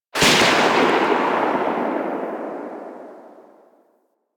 Shot Tail, Weapons; Warframe; Gorgon

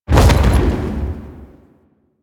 Horse Jump Heavy Double; Foley Actions; Warframe; Vehicles; Duviri

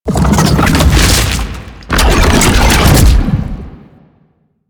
Octopede Lash Limbs Cast A, Sounds Enemies; Warframe; Man In The Wall

